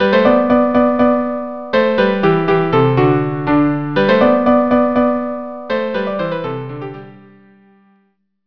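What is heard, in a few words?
violin viola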